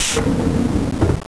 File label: Door slide; svencoop